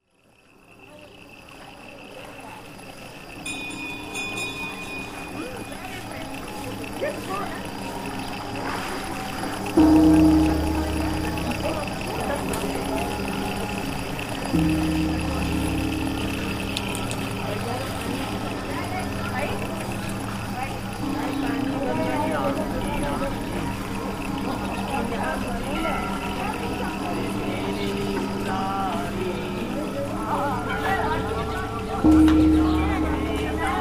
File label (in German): morgen glock; varanasi